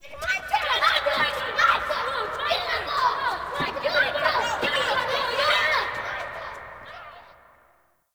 Dungeon Island Misc Children Ambience; Warframe; Duviri

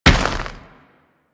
Step Dirt, Footsteps; Warframe

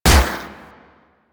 Akimbo Pistol Shot; Grineer

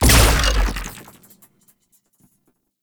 Shot; Warframe; Weapons; Corpus; Crp Freeze Ray; Tenet Glaxion

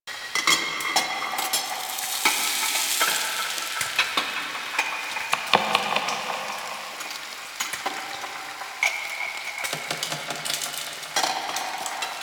Cooking Loop; Ambience; Warframe; The New War; Quests; Zariman

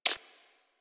Bird Of Prey Feed Ground; Characters; Warframe

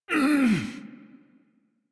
Struggle Short; New War; Ballas

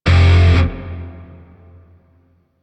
Shawzin Min Chord C; Grineer; DE; Warframe

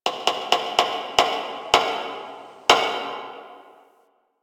Metal Creak, ambience; One Offs; Warframe; Quests; The New War; Drifter Camp

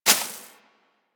Bullet Impact Debris Grass; Warframe; Weapons

